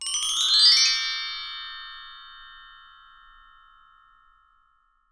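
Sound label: brass mark tree; POP ROCK SET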